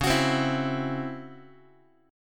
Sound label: C sharp Minor Major 7th Double Flat 5th, Guitar; strummed; x,9,9,10,8,x; 4A,4E,5A,5D,5G#,6C#